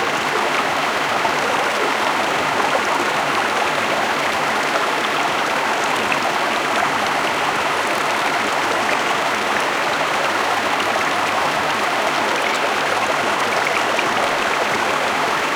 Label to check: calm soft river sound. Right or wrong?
Right